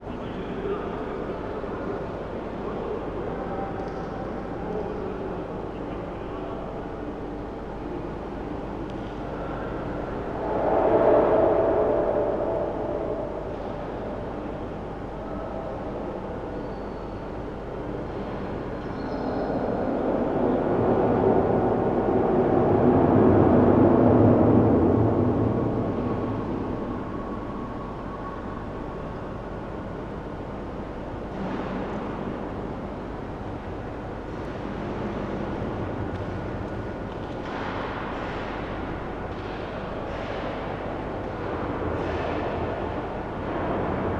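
industrial power plant ambience large reverberant building construction work in; felix blume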